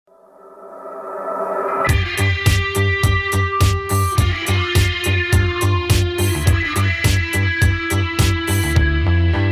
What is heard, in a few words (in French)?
accords